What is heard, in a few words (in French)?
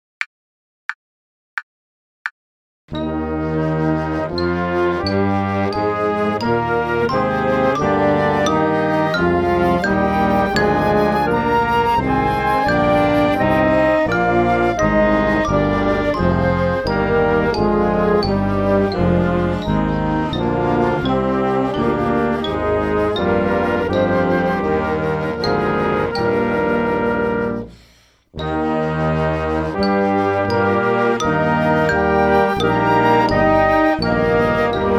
gamme polyphonique noire à 88